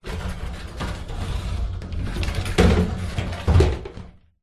household shower door plastic glass slide open close; zapsplat